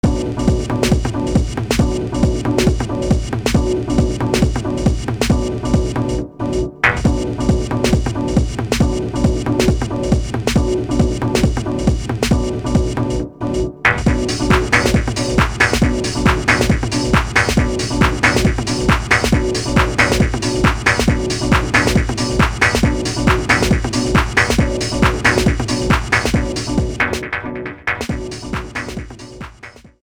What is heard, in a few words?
BoomBox loop